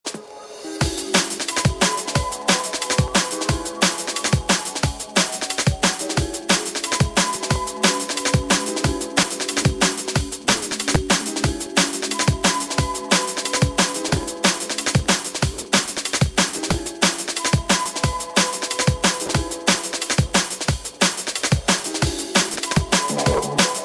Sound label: Drum and Bass Jungle, UK Bass Radio